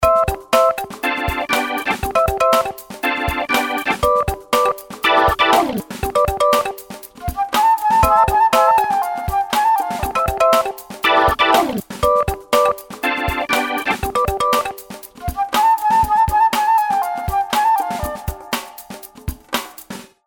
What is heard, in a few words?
Organ, ringtones; Coolpad; ivvi-K1-mini